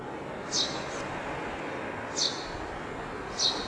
suzume; zukan

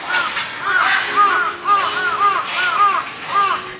hasibutogarasu; zukan

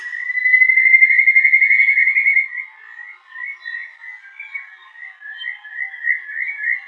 kajikagaeru; zukan

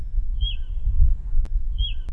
kotidori; zukan

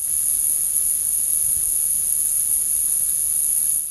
kusakiri; zukan

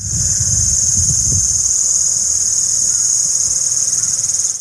aburazemi; zukan

